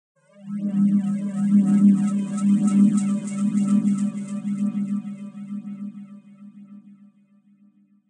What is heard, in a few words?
NOISE